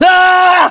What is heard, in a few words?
melee attack; english; voiceovers